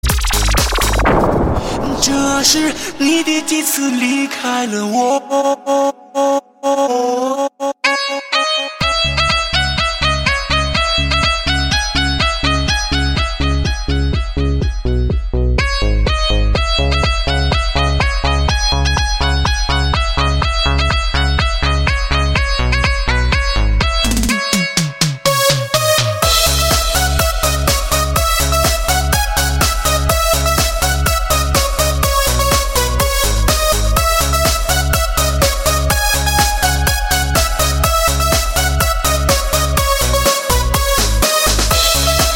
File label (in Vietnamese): Liên Khúc Nhạc Remix DJ; Nhạc Chuông